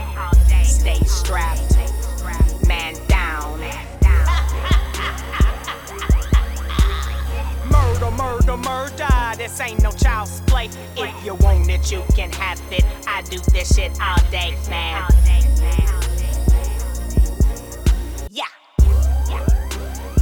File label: black widow film sample